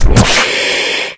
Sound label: antlion shoot